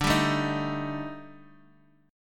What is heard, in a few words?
D sharp Major 7th Flat 5th D, chord